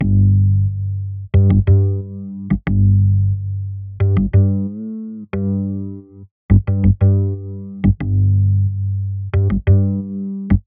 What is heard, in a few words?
90 G Bass; Flock